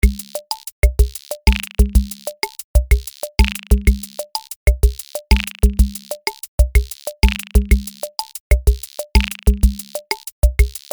combo; tiny pops; superwave

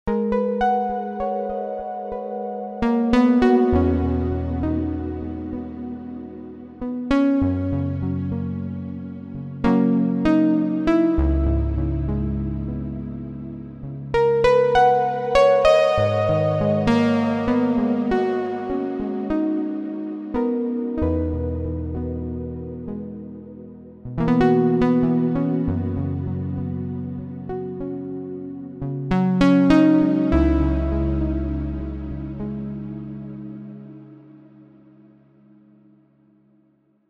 unstable guitar; unstable synth; morphoice

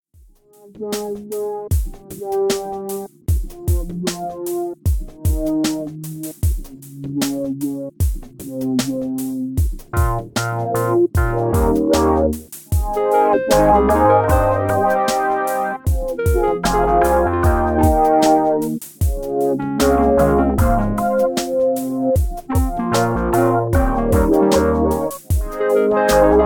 old warm pad

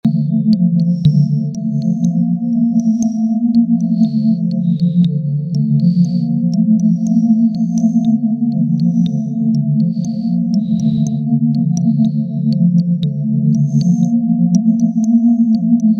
synth halide

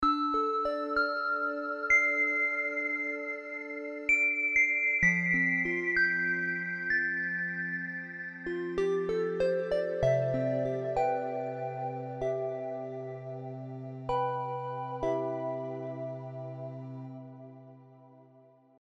unstable soft bells; unstable synth; morphoice

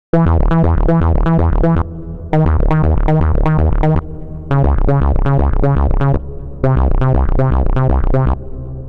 step seq 4 steps up and down; the meditation soundset

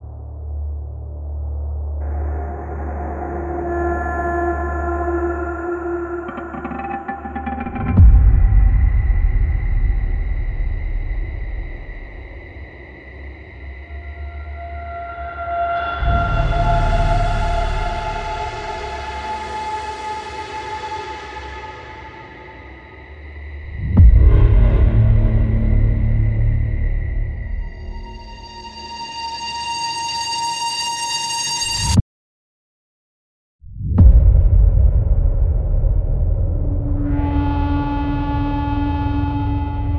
amped mangled guitar; sampletraxx

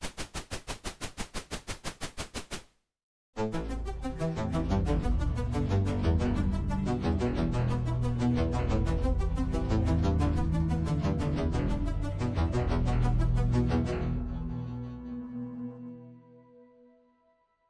synth; aava; viiri audio

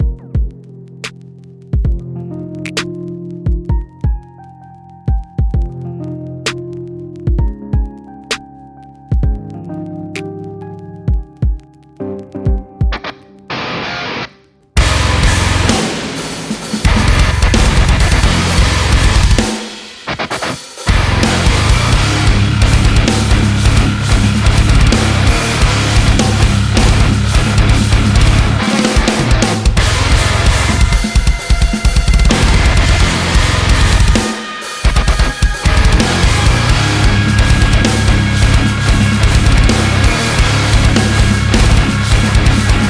buzzer bass; polarity audio

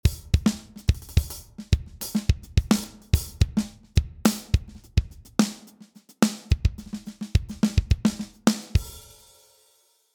drums stage custom; magika drums; elektra magika; ableton

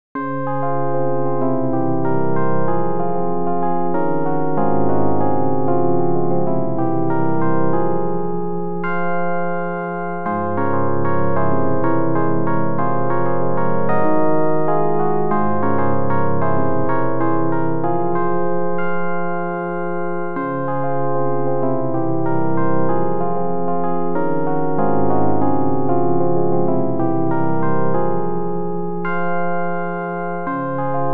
keys sample; warmth keys by warmth dev

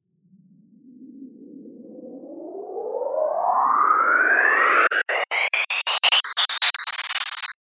riser; wavefield

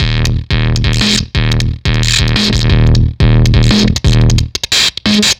Fuzztest drum broadcast

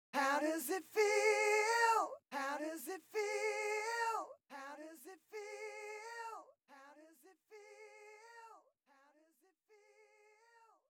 vocal 2 clean delay, colour delay; hofa plugins

